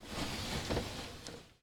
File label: SCREEN DOOR WIND